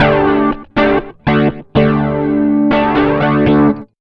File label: rock, acoustic guitar loops